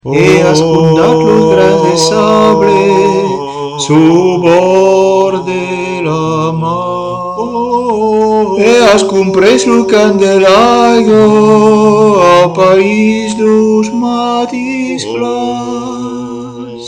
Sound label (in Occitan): soprano basse